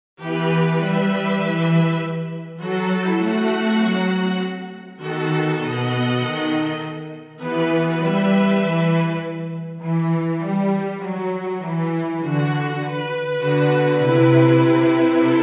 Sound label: instrumental; Handel; Messiah